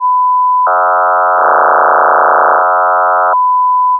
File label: freq And Amp Mod